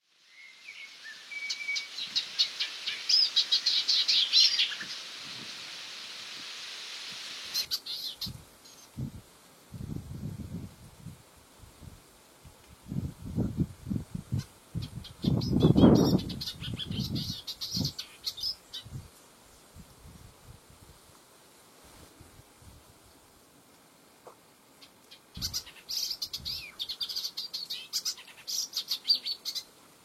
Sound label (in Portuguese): canto da felosa palida